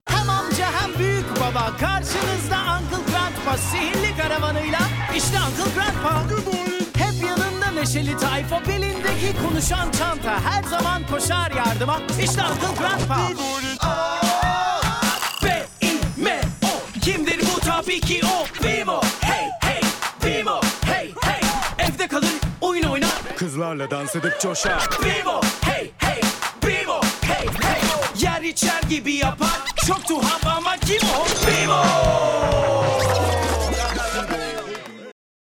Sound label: Cartoon Jingles